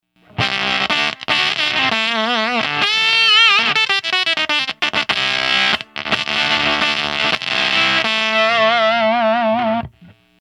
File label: Two Input Normal Before